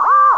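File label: combat robot servo surprise; hl2; combot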